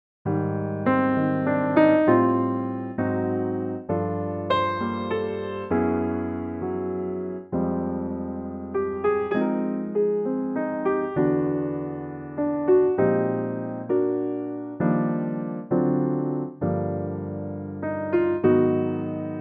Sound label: Eb Piano; Pianotrak 2017; key - Eb - vocal range - Bb to D; The intimate touch of just a piano works great on this classic standard covered by so many great singers.